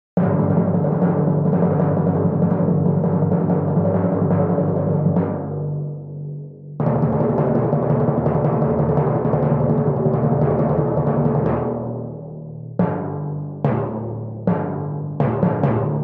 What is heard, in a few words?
TIMPANI